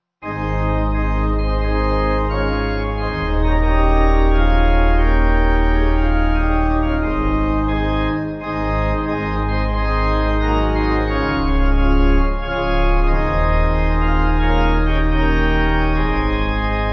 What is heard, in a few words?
Pipe